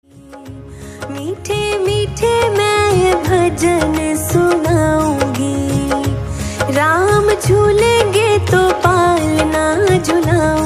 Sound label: Bhajan